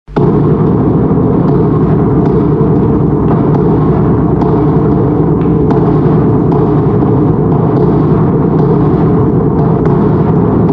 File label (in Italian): danza percussioni; gino-niemiz